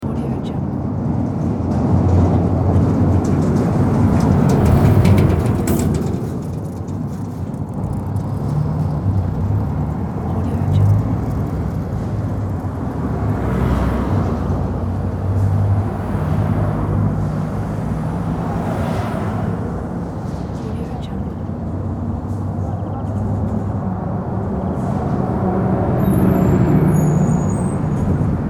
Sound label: city ambience traffic